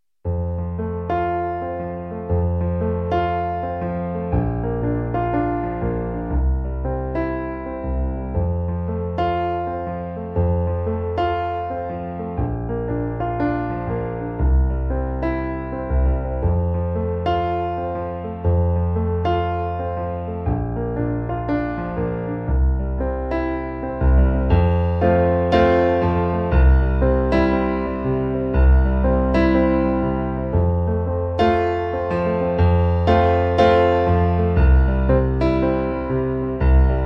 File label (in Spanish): PIANO